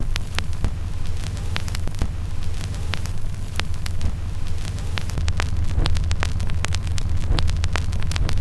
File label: crackles, Vinyl